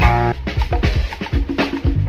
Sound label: glasses; Drum loops